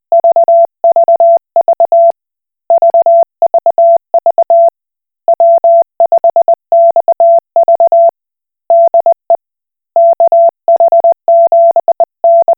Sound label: Koch Morse